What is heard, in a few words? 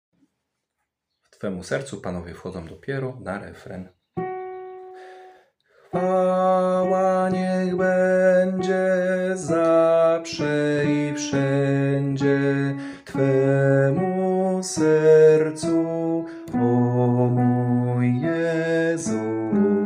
bass; nagrania glosow